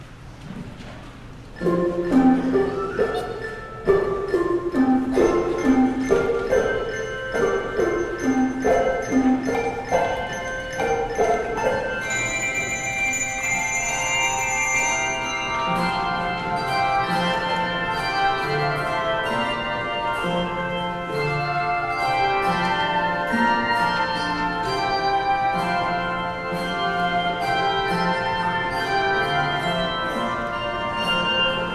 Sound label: Handbells; Comfort Comfort Ye My People; Dobrinski